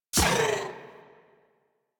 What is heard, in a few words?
Dodge Impact; Warframe; Warframes; Pacifist